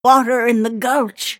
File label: move pain; snapfire